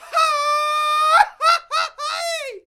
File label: Grito Haahai; Roland BK-5